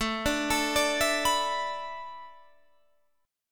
A Suspended 4th Add 9th A

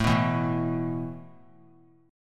A Suspended 2nd A; Asus2 strummed

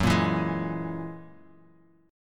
Ab 7th Suspended 2nd Sharp 5th, chord; Ab7sus2#5/Gb strummed

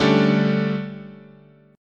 D Major 7th Suspended 4th, guitar chord

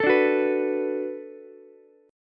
D 6th D down Ukulele, chord; D6 strummed; JGuitar

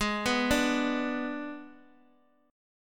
G sharp Diminished, Guitar, DADGAD; x,x,x,1,2,0

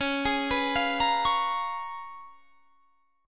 Db 13th Db; arpeggiated; 21,23,21,22,23,21

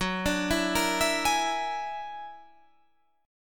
F sharp Augmented 9th F sharp Guitar Standard; F#+9 arpeggiated; 14,17,14,15,17,16

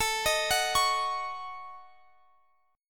D sharp Minor 7th Flat 5th, Guitar

